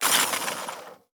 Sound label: handblender stirrer attachment